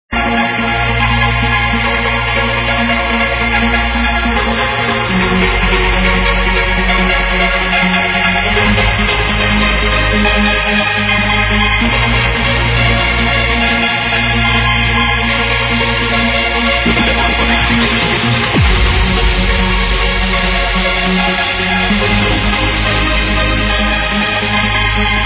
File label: violin